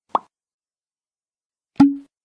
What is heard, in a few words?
Pop Sound Effect